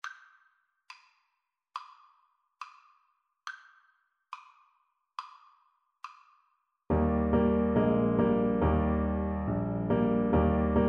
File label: jingle bells, Baritone Saxophone